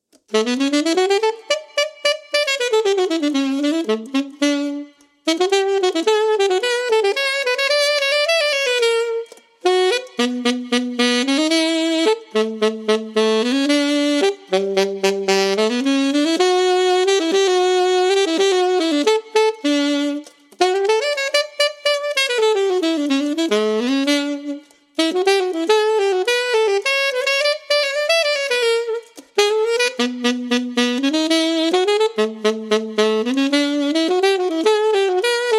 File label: alto sax